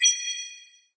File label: Sfx - Money Stat; Farm Mania